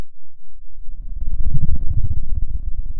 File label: Sound of a dinosaur